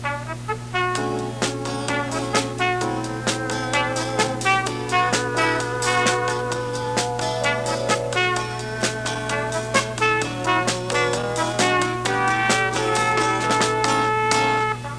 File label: thinking music